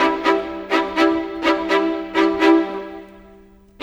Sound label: Violins; Sonic Foundry (Sony Creative Software); Orchestral Series VOL-4; Rock and Pop; Rock-Pop 18